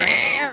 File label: pain; HALFE LIFE 3